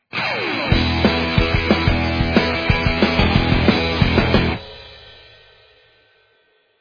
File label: stinger ident